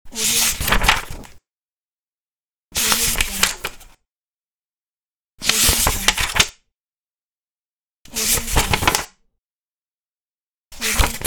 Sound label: turning page